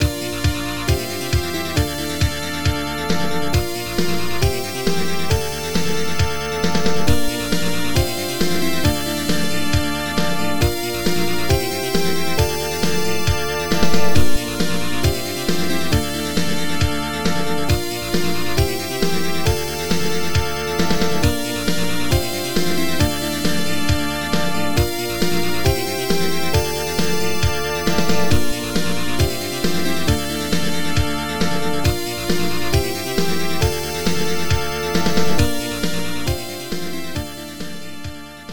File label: Mission Start; sion iv; x68000